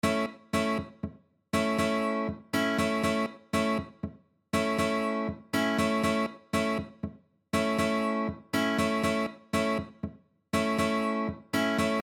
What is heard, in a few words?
Rhythm, guitar